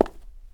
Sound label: cement step; Golden Crayon Sounds